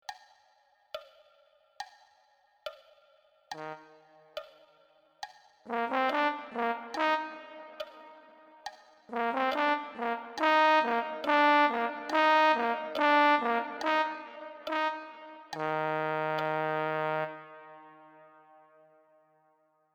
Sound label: coda 70bpm